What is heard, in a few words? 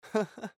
Laugh